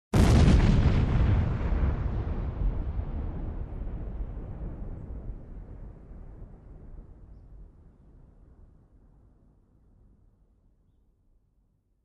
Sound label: Bomb Blast